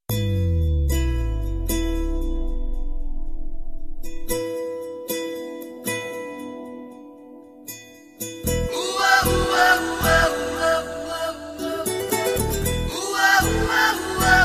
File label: Rhythm Guitar